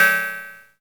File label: Percs, Elektron MachineDrum; SPS1 MKII